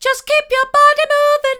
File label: just keep your body moving hard, vocal